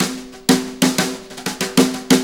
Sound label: Brushes Fill; Sonic Foundry (Sony Creative Software); Loops & Samples Drum Tools